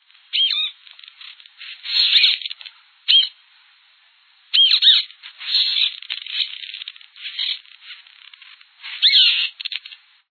cuitelao

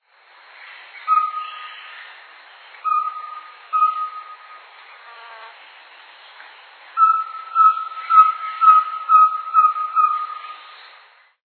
corneteiro-da-mata

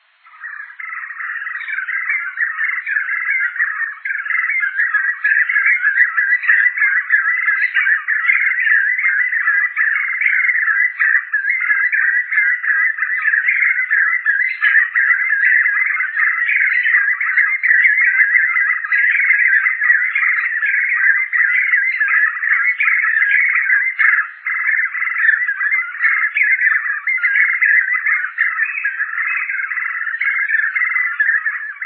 bico de brasa

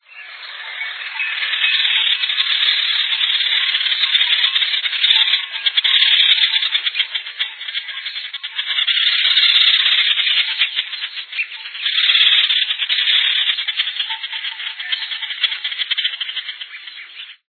mergulhao pequeno

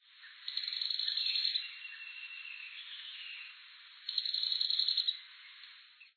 choquinha-ornada

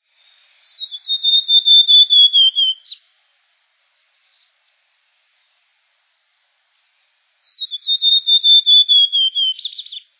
azulao-da-amazonia

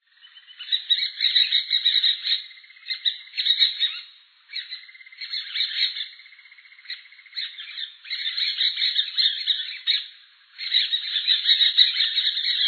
tiriba-de-testa-azul

